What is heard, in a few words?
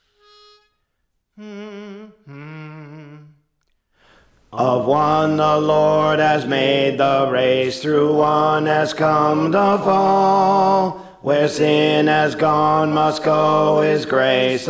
Alto; The Gospel Is for All; Praise For The Lord Training